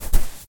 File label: Footsteps footstep sand run; SFX; Environment; ProSoundCollection v1 3